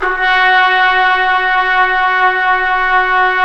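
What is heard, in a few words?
BRS TPT P, Brass Trumpet p; Roland L-CD702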